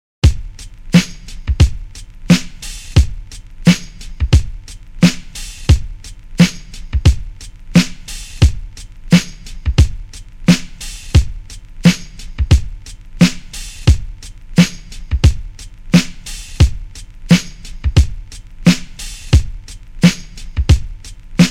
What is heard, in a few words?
92bpm